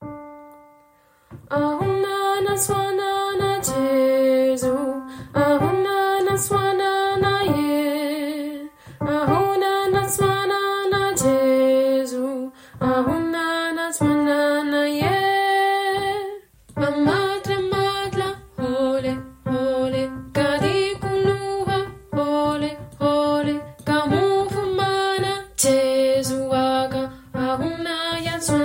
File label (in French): basse